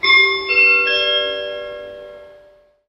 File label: Chime